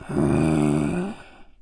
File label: mummy neutral; spawners mobs